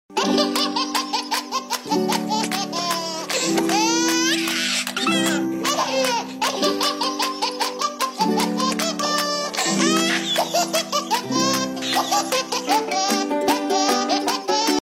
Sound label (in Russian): smekh rebenka